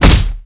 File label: hit high